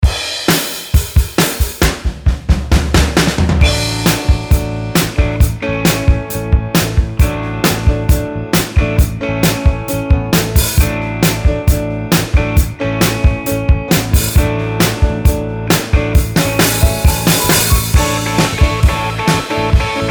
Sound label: slide solo backing